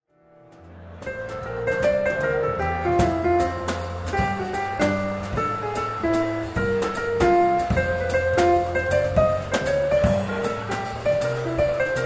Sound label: trio